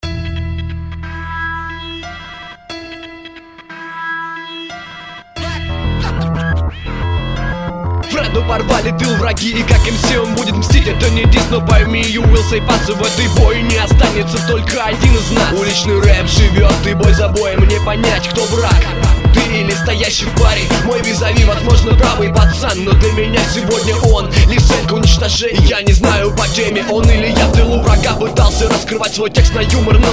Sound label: street rap battle